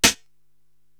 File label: SD Jungle, Korg TR-Rack drum machine